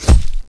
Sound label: Arrow Hit